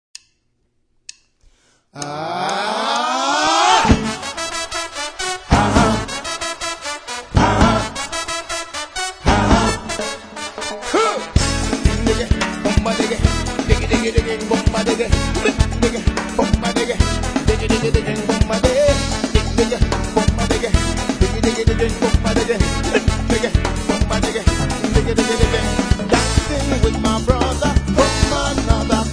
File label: reggae